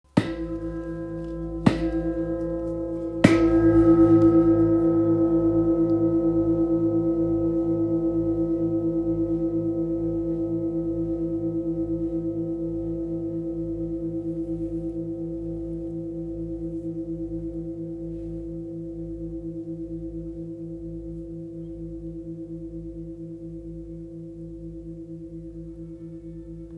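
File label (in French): Gros bol mi, bol chantant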